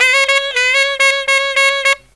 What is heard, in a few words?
SAX, Killer Brass Riffs; USB Soundscan vol.29 - Killer Brass Riffs; AKAI